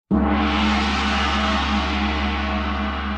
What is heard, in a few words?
gong sms ringtone